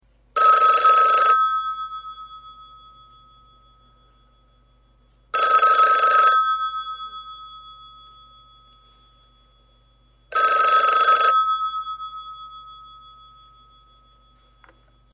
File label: Europe Bell phone sound